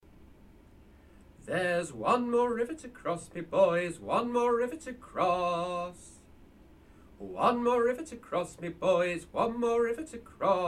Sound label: Singing and Bugle